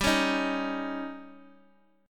Db Suspended 2nd Flat 5th

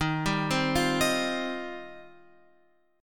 Eb 6th Add 9th, Guitar, Open F; arpeggiated

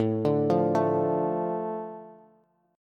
A Suspended 4th Sharp 5th, A, Bass; Asus4#5 arpeggiated; JGuitar

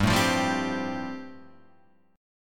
G 7th Suspended 2nd Suspended 4th G chord

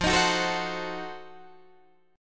F 7th Flat 9th, Guitar Open D

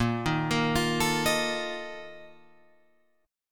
Bb Major 7th Suspended 4th down Guitar Open F; arpeggiated; JGuitar

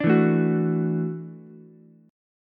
E 9th Suspended 4th E down Ukulele C6; E9sus4 strummed; JGuitar

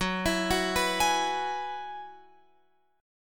Gb 9th Suspended 4th Gb down Guitar Open F, chord; Gb9sus4 arpeggiated

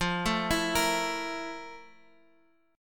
F Major 11th, Guitar, Drop D; 15,12,14,15,x,x; down; chord shape

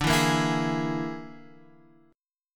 E 7th Flat 5th, D

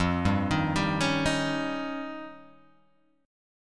F 7th Suspended 2nd Sharp 5th, Guitar, Open G

